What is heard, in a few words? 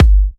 G, Kicks; markus hakala